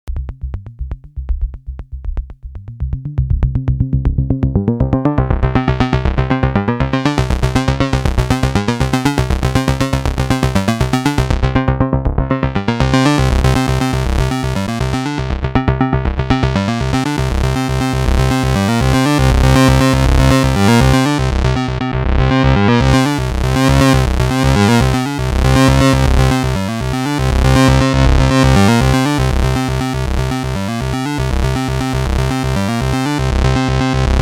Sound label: Dist LFO Bass LPF